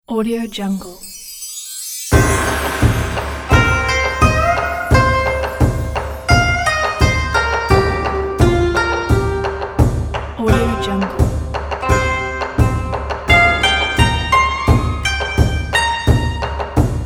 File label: chinese guzheng intro